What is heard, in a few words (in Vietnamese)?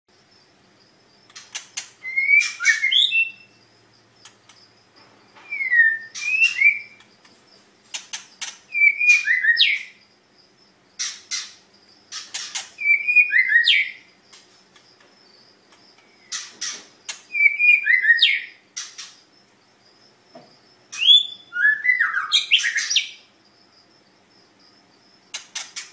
Tieng Chim Chit Choe